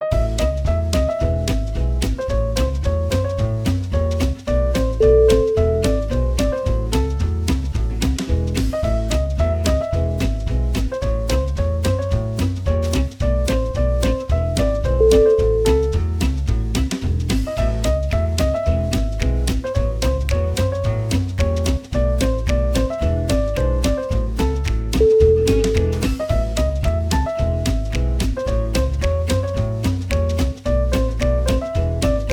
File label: taking it jazzy